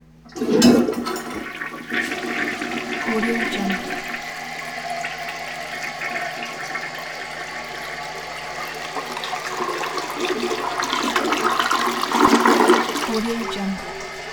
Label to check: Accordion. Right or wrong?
Wrong